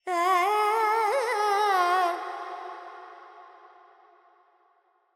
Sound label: FX Purvi Kalyani, Carnatic Female Alaps; Vocals; Sample Banks